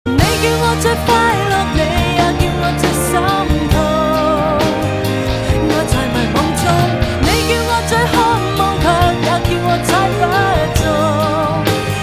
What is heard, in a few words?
mp three ringtone